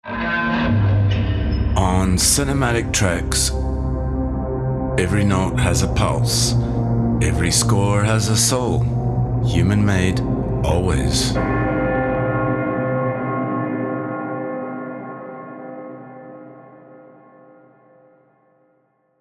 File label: sweeper